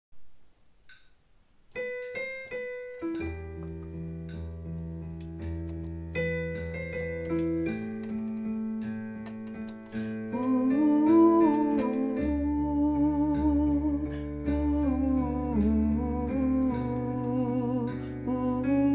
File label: alto; valses de vienne